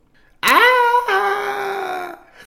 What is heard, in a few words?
scream